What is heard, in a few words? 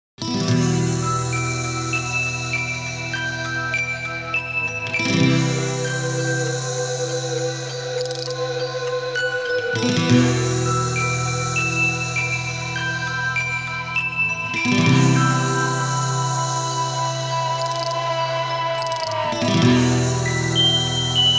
music box